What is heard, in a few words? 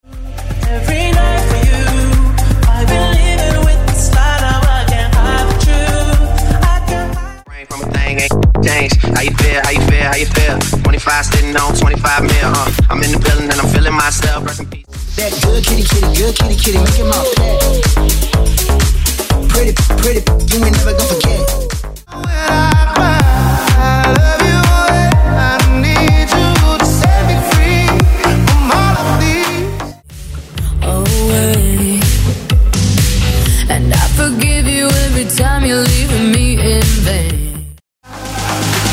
Pop Top40 House Megamix